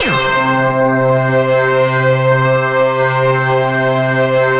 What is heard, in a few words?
SYN K-2000, Roland